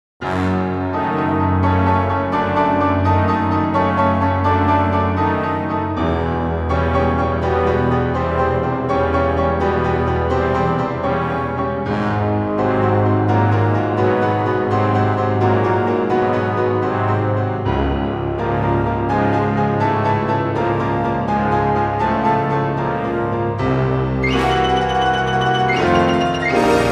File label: Bass